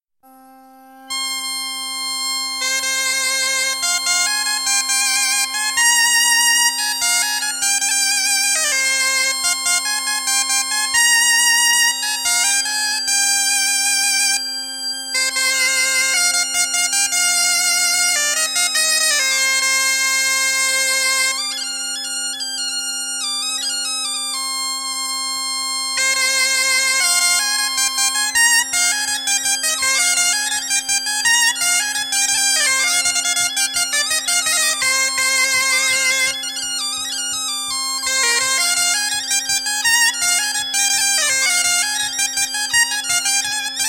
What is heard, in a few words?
Plinn, Biniou Bombarde; Plinn - Ton Simple; Bro Dreger